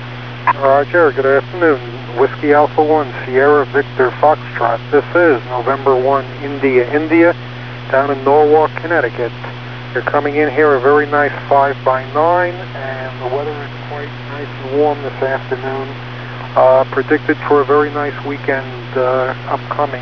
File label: dsp wide